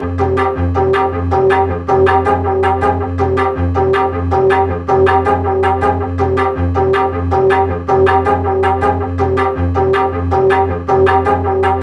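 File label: synth loop hollow tension 160 F minor; Virtual Riot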